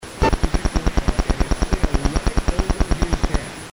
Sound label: roku soundbridge remote, infrared